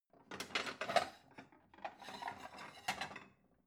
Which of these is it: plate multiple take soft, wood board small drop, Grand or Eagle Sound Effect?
plate multiple take soft